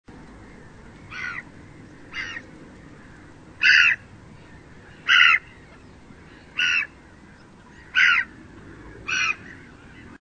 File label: black headed gull; birds